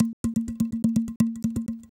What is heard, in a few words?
bottle, ethno, 125